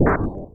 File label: Small blast; Devil's Machine turned off